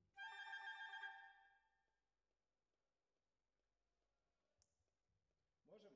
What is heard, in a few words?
Violas, jazz for piano